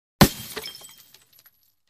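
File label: Television Tube Explosion; TV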